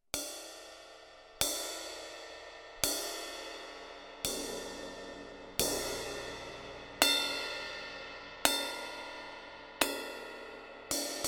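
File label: crash ZILDJIAN K DARK THIN 16, CYMBALES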